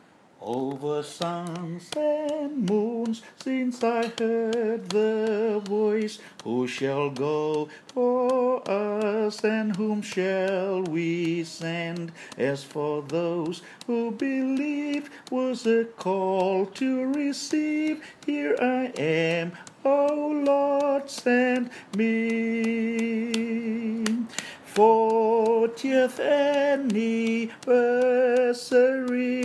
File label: Song With Music